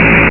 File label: THRASHER